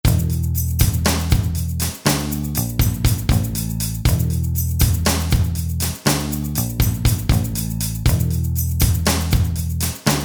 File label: Hard Rock